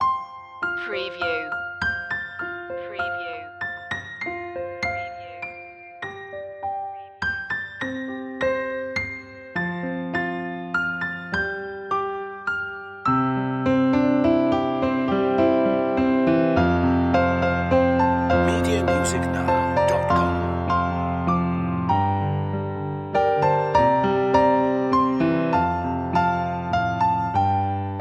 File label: piano loop; away in a manger; media music now